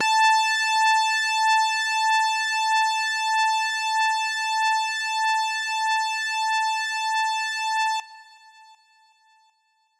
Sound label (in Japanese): 音程 男性 A4